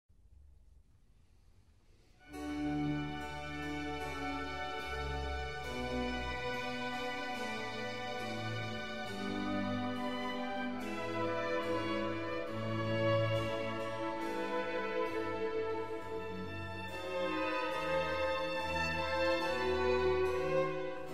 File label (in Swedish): långsamt klassiskt air